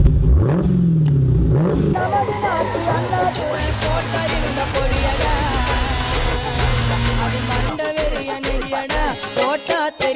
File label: Phonk Song